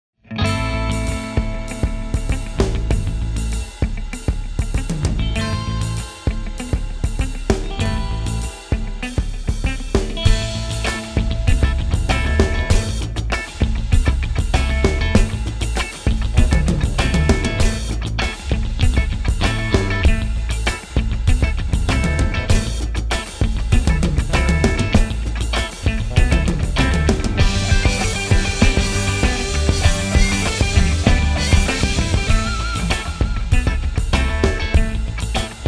Muzzle And Drums; Haynes

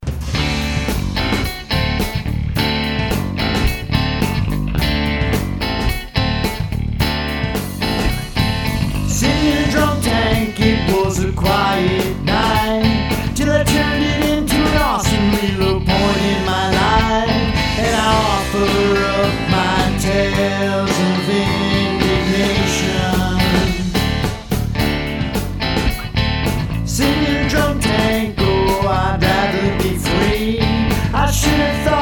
Dunk Tank Bass; Audient

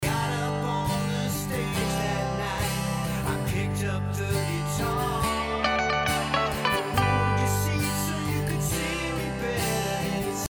gtr fill

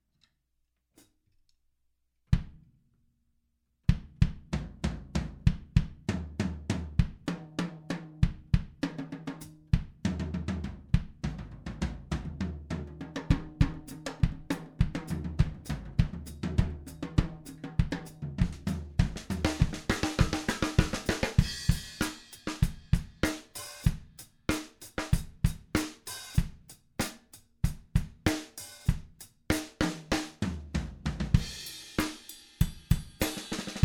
drum sound dry; Audient

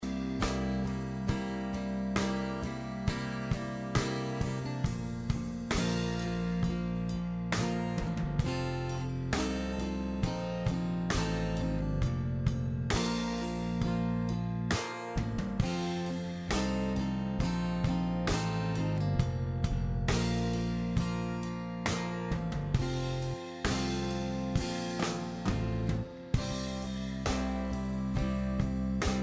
flute drum sound; Audient

